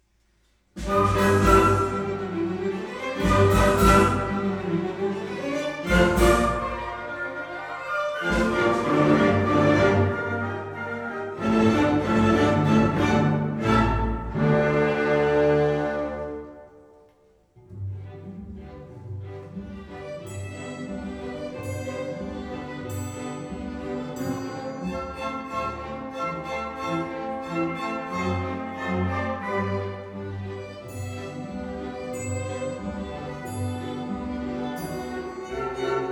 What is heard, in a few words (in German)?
Orchester; Frühjahrskonzert 2018